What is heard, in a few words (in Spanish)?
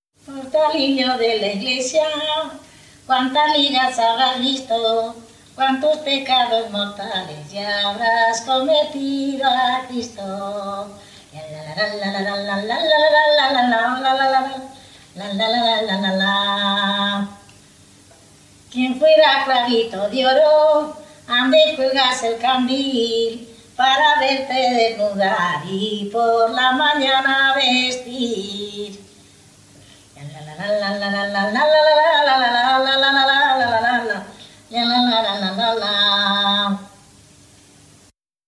Tonada, La Pedraja de Portillo; Tonada "Sombrerillo, sombrerillo"